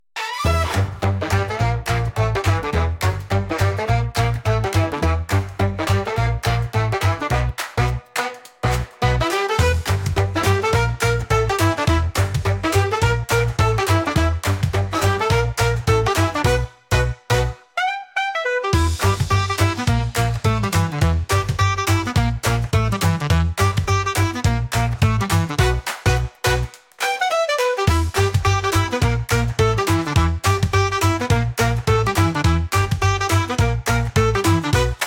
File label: Electric Swing Tango